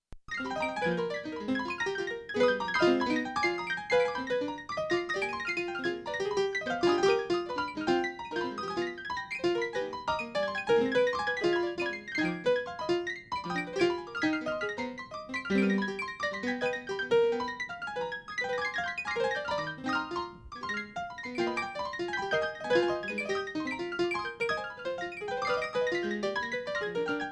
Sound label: Piano